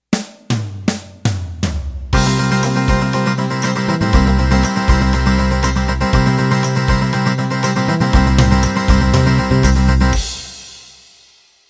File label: Country 16Beat End